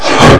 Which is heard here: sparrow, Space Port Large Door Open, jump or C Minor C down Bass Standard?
jump